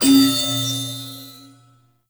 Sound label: Receive Buff; Warframe; The New War; Narmer; Grineer